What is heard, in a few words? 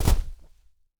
barricade sandbags deploy; Rust